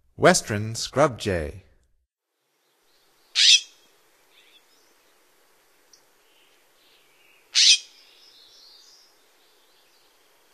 Western Scrub-Jay, Songs of Birds of North America; Stokes